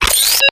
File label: quest status tick novice